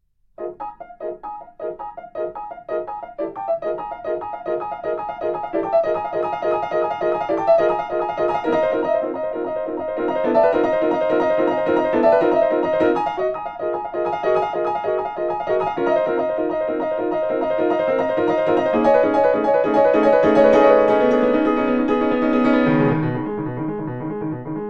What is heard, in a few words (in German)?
Glocken; vienna